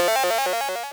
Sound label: point; flappybat